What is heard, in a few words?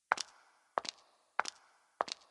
footsteps; WarhammerQuest